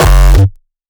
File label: Freeze bip, bass